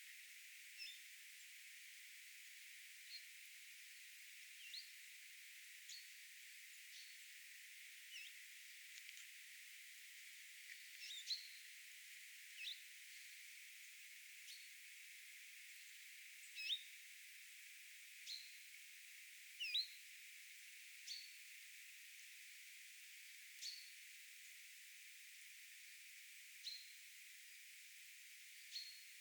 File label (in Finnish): nuori pajulintulintu peippoja